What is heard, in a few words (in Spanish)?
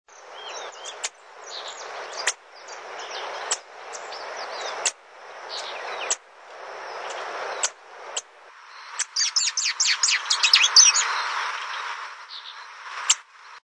cardenal amarillo